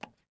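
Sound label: wood run left, footsteps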